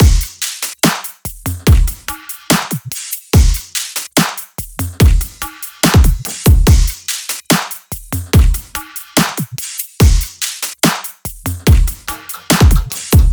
Drum Loop 144 BPM, Future Bass; Brisket

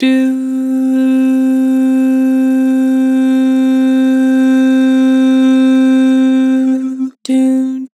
"Doo", Vocals; Harmor

